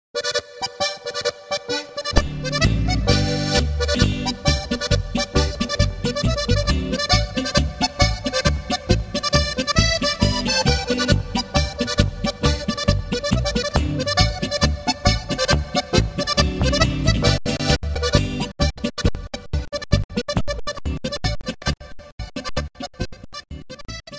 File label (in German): jochl polka